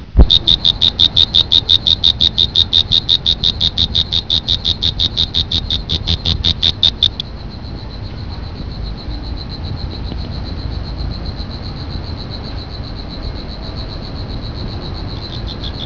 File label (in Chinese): 蟋蟀, 昆虫; 动物; 特酷声音素材库